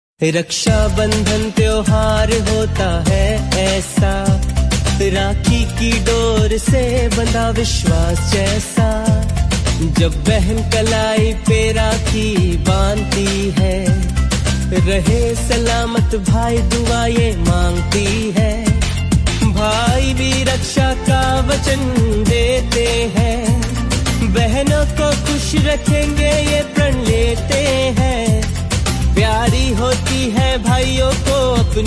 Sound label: Song Ringtone; Raksha Bandhan 2020